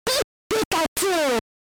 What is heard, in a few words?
Pikachu SFX; RBY